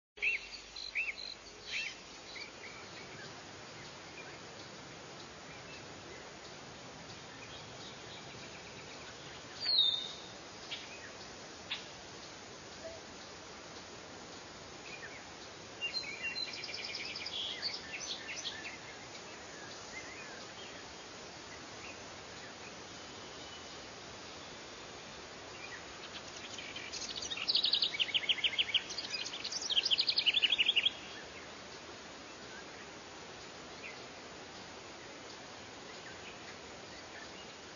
meadow, ambient; PHARAOH

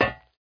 run metal dry; MOTS

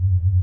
mystery mall loop; Earth and Beyond; EA Games

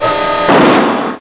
Button Load

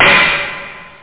clock up hi hat

